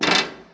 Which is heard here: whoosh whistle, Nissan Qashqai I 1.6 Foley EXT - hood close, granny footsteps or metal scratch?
metal scratch